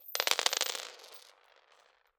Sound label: Spill On Floor, Medium Wine Glass